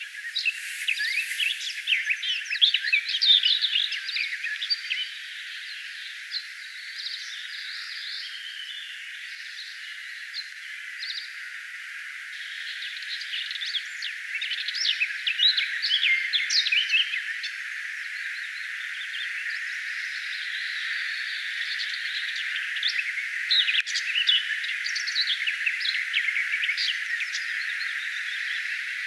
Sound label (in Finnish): lehtokertun laulua